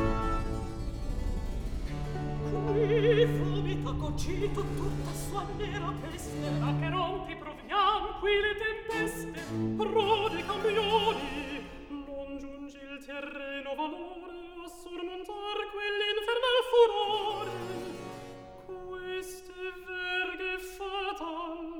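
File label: Recitativo, Handel opera; Bernarda Fink; Act 3; Qui vomita Cocito; Handel - Rinaldo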